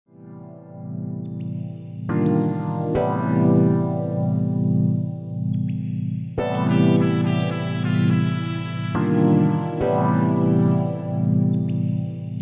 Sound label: smooth jazz cafe